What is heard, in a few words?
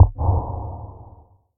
quad damage out; ML-Agents; FPS Game